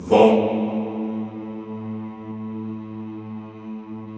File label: CHO A1, Vohmm Hohmm; Sound & Vision; Gigapack I CD 2